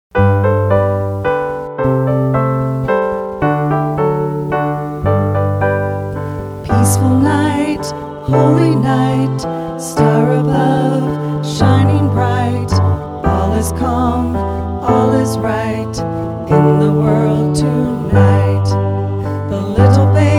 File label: Vocal; This Peaceful Night